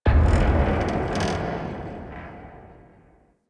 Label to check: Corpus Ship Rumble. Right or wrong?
Right